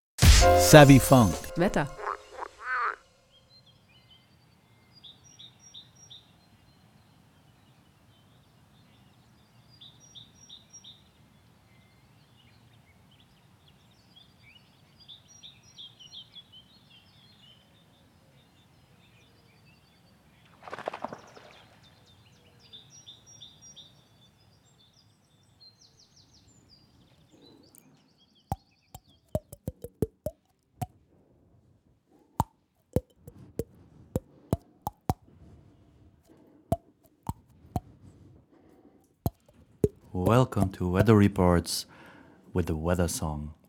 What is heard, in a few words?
trumpet blackbird